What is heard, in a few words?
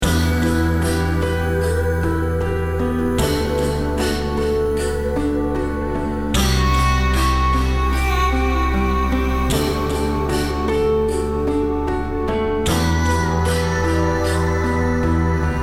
Healing Melody